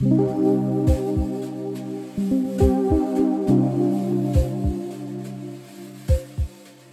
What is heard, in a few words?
Chillout, Loops